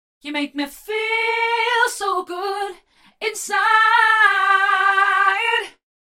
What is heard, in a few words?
Warbling Chorus Vocals Wet; Vox Quantizer; syntheway